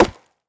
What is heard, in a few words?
tree impact; Rust